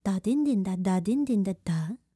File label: TABLA BOLS, E.INDIAN; World Voices; ILIO; Vocal Planet